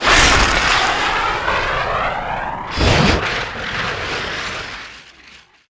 rpg away; weapons; bullets

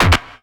death beep; player

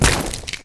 fall pain, footsteps; movement

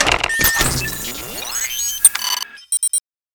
detpack drop

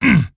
pain, player

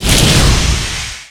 flamethrower start; weapons

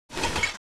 rpg reload; weapons

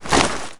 ammo; player

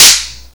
snap, hookgun; weapons

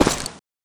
jump, footsteps; movement

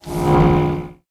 sentry scan

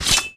deploy, knife; weapons

